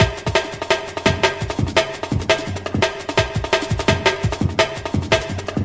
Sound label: 170 nonstop break, drum loops and breaks; 161 to 180 bpm